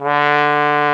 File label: BONE, Trombone; Solo Brass